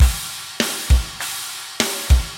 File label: metal